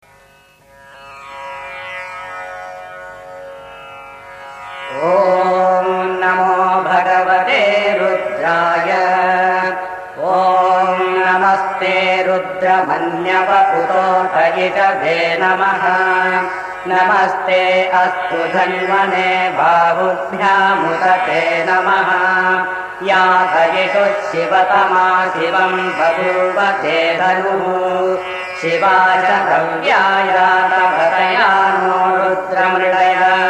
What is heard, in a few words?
rudram